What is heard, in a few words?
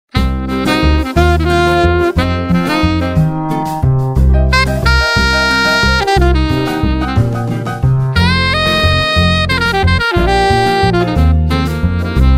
pentatonic; blues